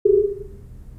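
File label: 0deg reversed, 400 Hz tones with 50 Hz sinusoidal amplitude modulations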